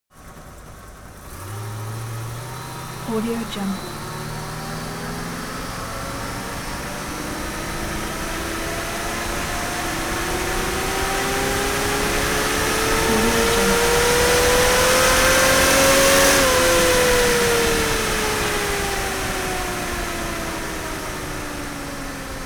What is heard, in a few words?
transportation vintage car chevrolet 57 drive engine bright